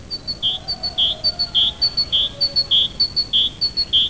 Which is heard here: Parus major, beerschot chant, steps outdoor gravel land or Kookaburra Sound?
Parus major